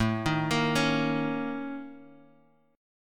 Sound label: A sharp Minor Major 7th Double Flat 5th, A